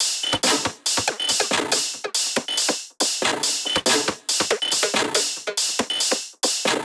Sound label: tops 140, Drum Sample Pack Loops Top Loops; SD SEISMIC; Slate